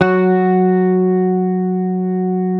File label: Hamer 12, SUS, F#3, Bass; Sampleheads; Will Lee Bass Library